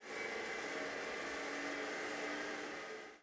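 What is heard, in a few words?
electric wheelchair roll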